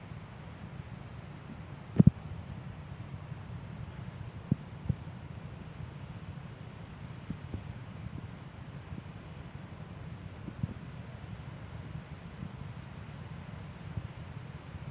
waves crashing

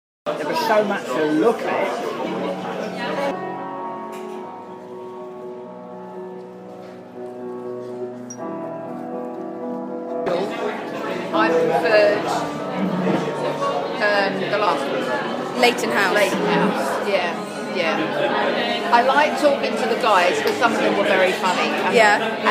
soundscape

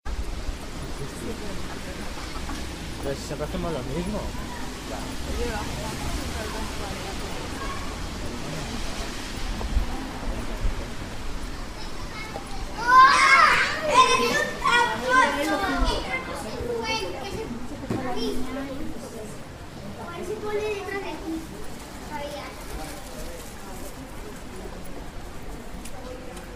sound of madrid

